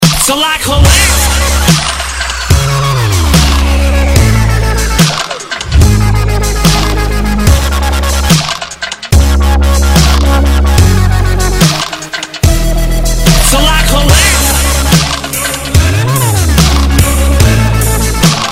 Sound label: dubstep remix, ringtone